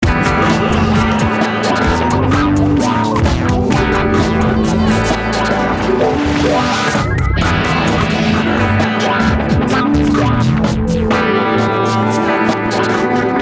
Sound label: Guitar